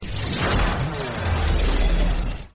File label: shockwave; transformers